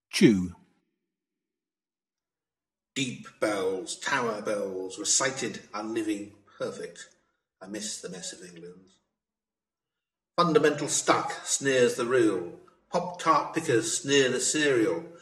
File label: chew